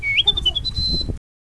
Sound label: higher whistle